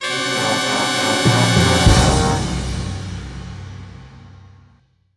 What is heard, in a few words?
Mission Failed! Jingle; the simpsons hit run; ps2; Kang and Kodos Strike Back - Jingle 1